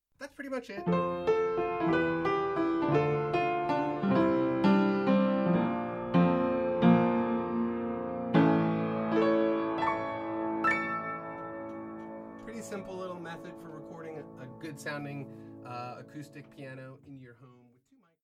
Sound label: Stereo Piano